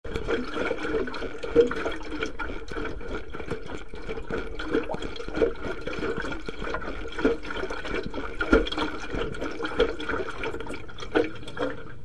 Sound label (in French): essence, moto r1150gs